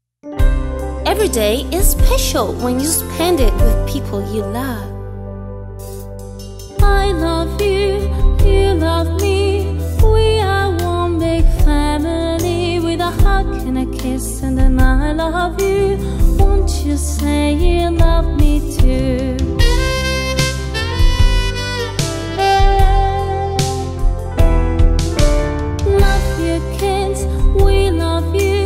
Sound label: lullaby